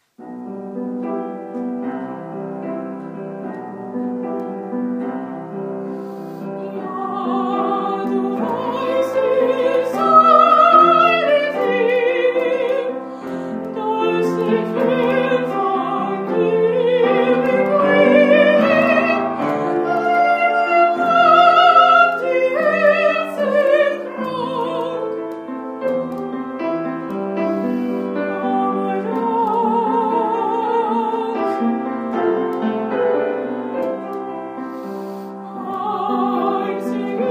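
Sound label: Zueignung [opera][singing][soprano][German]; Strauss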